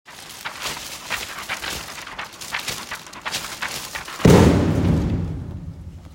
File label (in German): Donner Gewitter